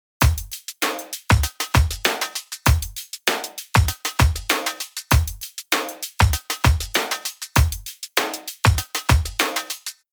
rythm

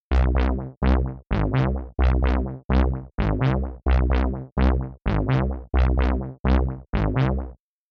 bass On

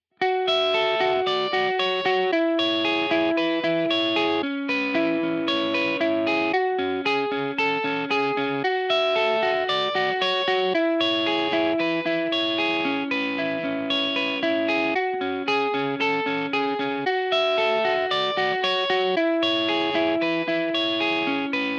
chorus off

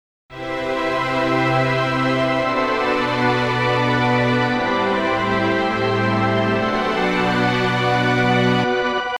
pad on

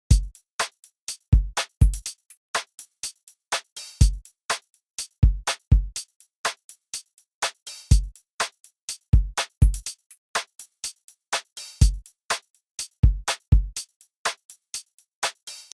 tape

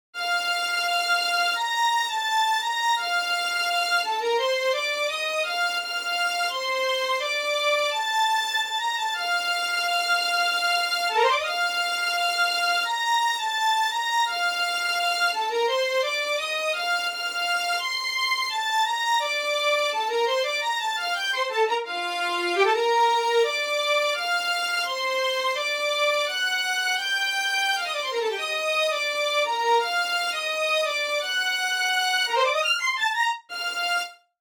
studio strings off

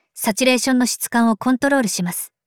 accent on

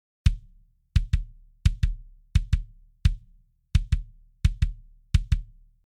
kick off

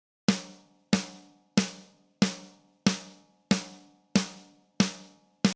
Drums Snare Off

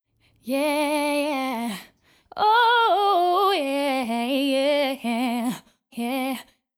modern off

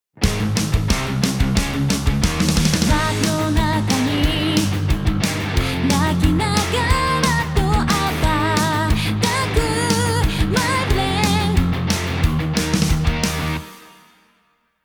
vocal Off

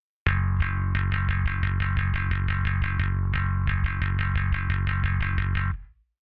bass on

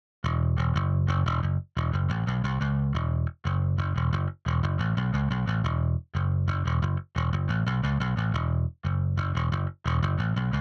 bass On

